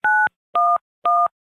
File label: 911 Tone Dial; blindside